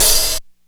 dark open hat sound d key

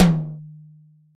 acoustic tom sound f key; tom drum samples; one shots

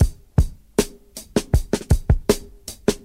105 bpm drum groove c key

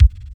tight kick drum single hit c key

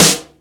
natural snare drum D# key; snare drum samples; one shots

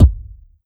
short reverb kick drum single hit a key

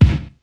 rap kickdrum sample c sharp key; 90s; kick drum samples

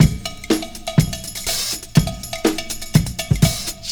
122 bpm 00s breakbeat e key

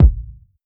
strong bottom end kick drum sample a sharp key

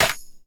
treble heavy snare E key

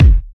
smooth bass drum f sharp key; kick drum; kick drum samples

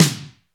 acoustic snare sample d key

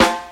vinyl record low funk snare drum sound f key

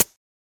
shiny hihat sample D key; hits one shots; hat samples

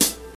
hot funk hat sound B key; 70s

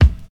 raw kick sample c sharp key

